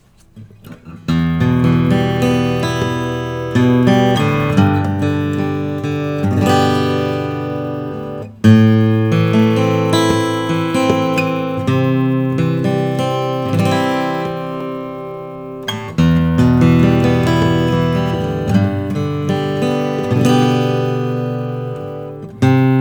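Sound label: bourgeois 00 vintage short scale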